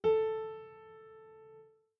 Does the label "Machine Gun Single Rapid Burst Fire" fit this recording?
No